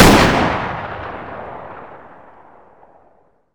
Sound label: rem870 distance fire